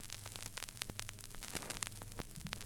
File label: Lofi